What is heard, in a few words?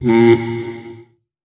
reverb, farts